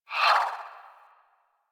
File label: Swing, Melee; Balance One; Warframe; Weapons; Modular Melee